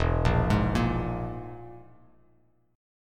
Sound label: E Major Flat 5th E, Bass Standard; arpeggiated